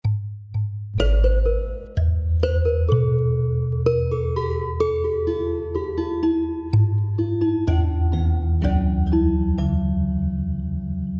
weighted str

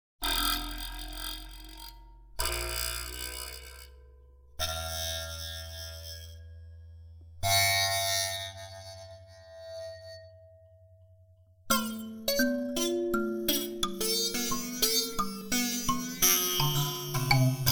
rattle tine

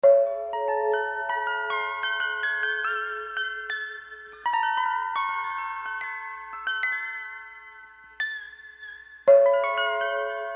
Trillium Harp

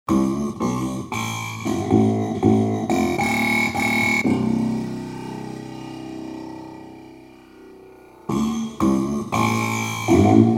Slide Rattletine

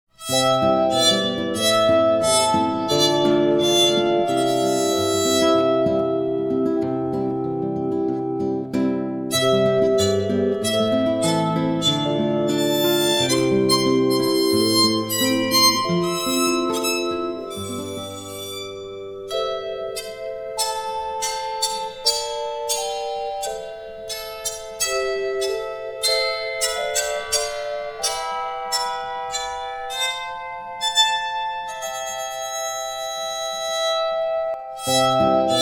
bowed psalt

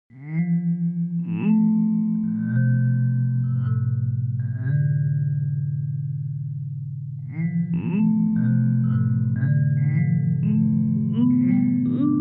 squeegy

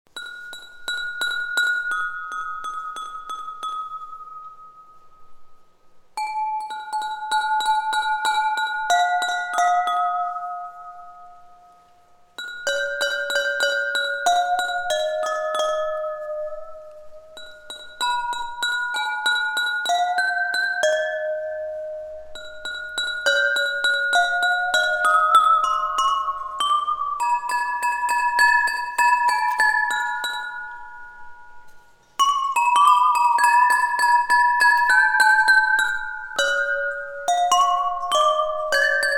Dipping Chimes

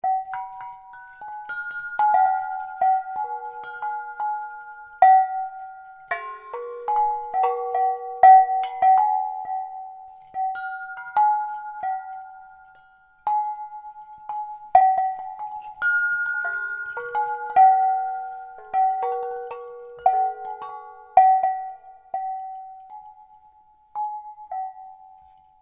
Wind Chimes Squashed Pipe

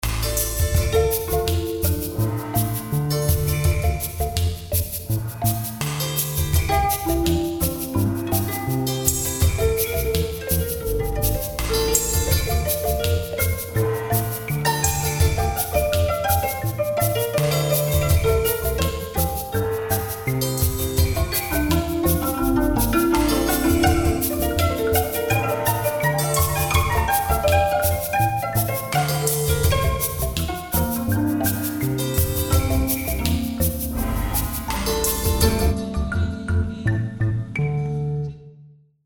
twist harp

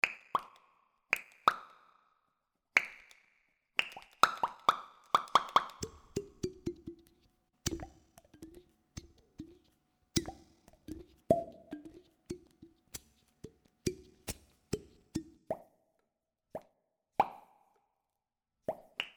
popguns

